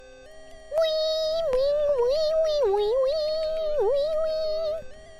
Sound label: weeeweeeeweewewewe